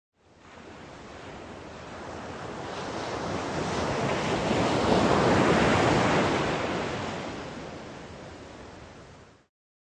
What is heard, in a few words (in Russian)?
volna dlja perehoda